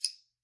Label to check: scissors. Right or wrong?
Right